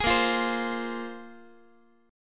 A sharp 7th Suspended 2nd down Guitar 4A 4E 5A 5D 5G sharp 6C sharp; strummed; JGuitar